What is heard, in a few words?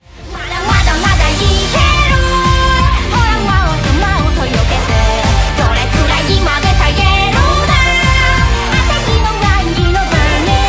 Warning; Sixtar Gate Startrail